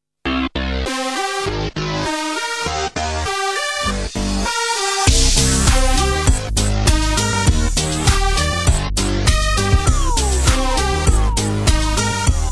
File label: bass sms